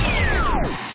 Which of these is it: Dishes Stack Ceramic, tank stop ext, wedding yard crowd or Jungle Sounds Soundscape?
tank stop ext